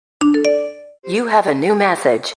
iPhone Message

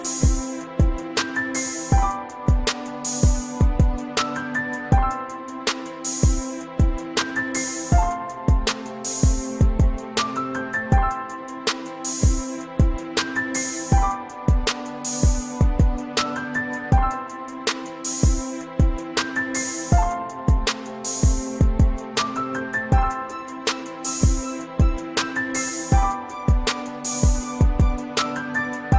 ROMANTIC MIX

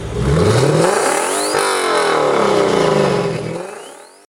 Twin turbo short

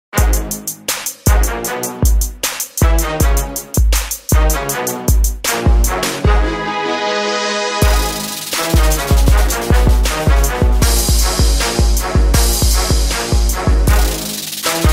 Bass Trap, ringtone

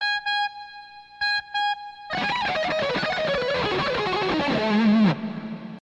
rocking guitar; blues; nokia

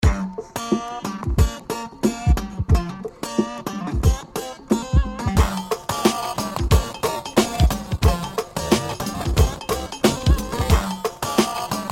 funky spot

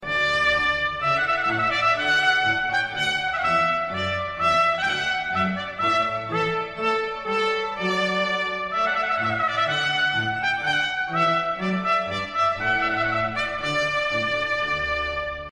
Trumpet Voluntary

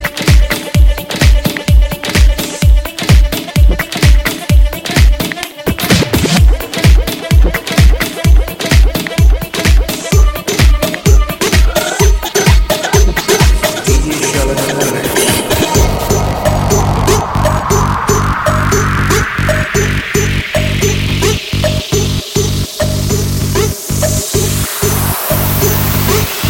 Dinga Ding Dangalong

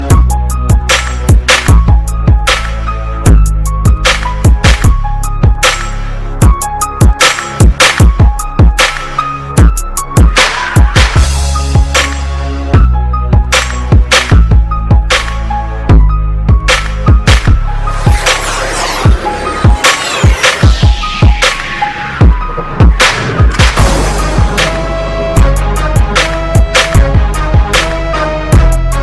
heavy bass; ringtones; hip-hop

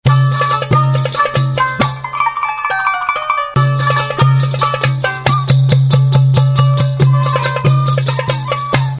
arabic muzik; ringtones

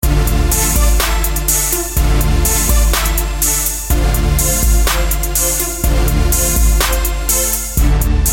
Trap

